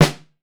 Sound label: GRETCH SN, BRAZIL SNARE; Voice Crystal - Steve Reid Definitive Percussion